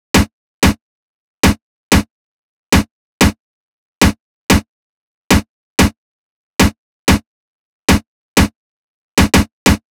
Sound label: Drum Loop 93 BPM Snare, Hip Hop; Drum Loops; Loop Stems; Cymatics; Manana Drum Loop